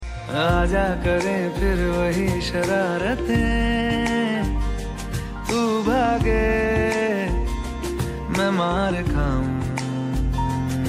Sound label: Song